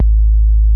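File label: synth kick, drum; Crypton - DrumBase5000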